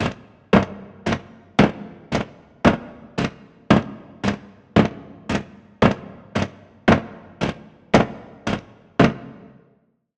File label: Clock; Routed Sound; Vital Expansion - Quantum Enforcer Vol.1